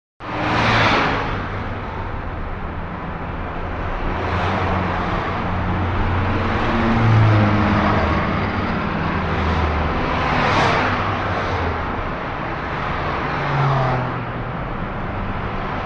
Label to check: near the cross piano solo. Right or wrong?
Wrong